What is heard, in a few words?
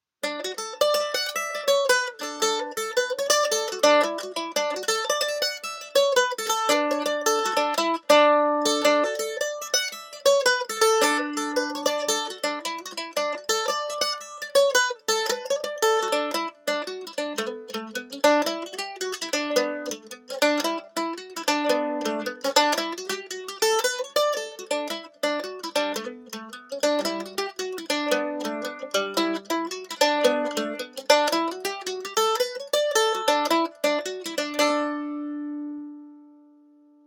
Mandolin Pick Comparison